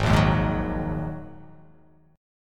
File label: Ab 7th Suspended 2nd; Ab7sus2; 4,1,1,1,3,1,x,x; strummed; chordshape; JGuitar